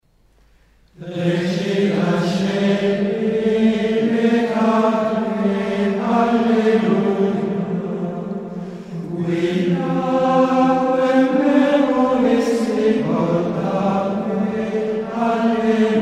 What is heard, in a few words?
Antiphon After Compline Easter; Regina Caeli